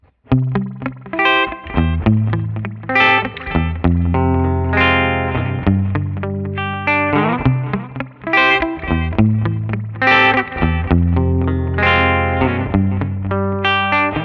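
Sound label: Rockabilly Bridge, guitar; 2016 Guild Newark Street Starfire III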